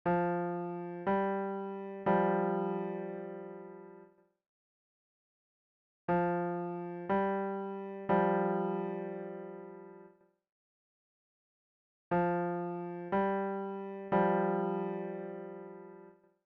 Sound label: bass f g